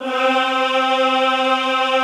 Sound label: EHH C3, Classical Choir; Best Service